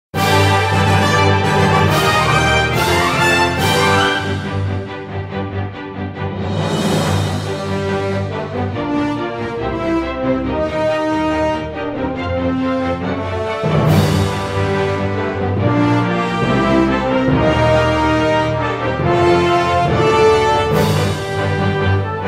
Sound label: Grand Opening; OGS